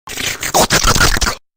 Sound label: perverted slurp; smg4